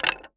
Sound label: Impact Wood Broken Chair Drop On Cement Floor